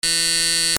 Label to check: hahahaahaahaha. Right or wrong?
Wrong